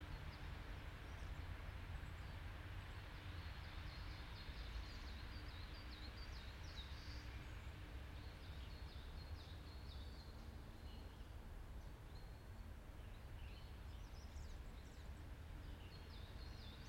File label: yamanakako sound